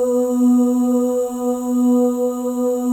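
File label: OH, female OHS, Voices; Spectrasonics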